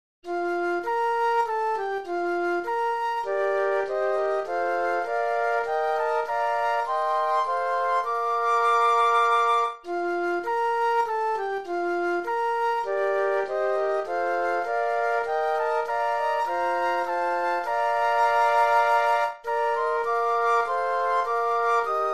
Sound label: Flute Quartet